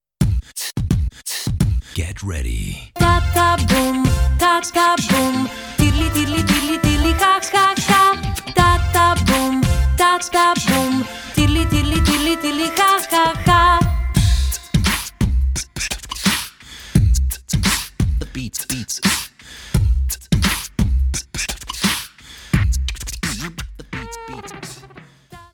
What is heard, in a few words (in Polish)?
rondo